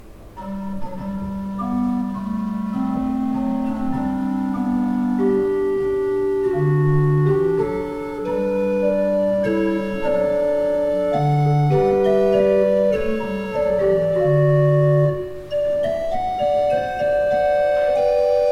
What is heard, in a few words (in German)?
Peter, gebrauchtorgel